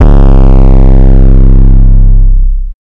808 Loop

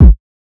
808 Kik; Kicks

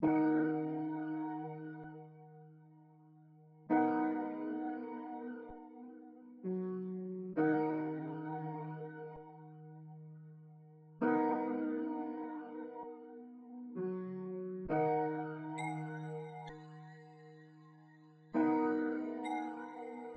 Too Late 131 BPM

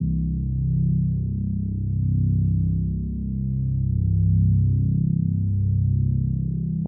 reese

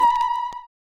Vox

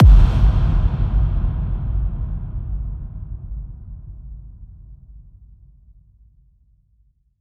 Impact

